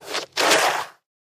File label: shovel snow